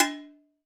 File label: Layer Bell